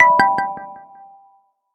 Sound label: Hey, notifications; Realme 8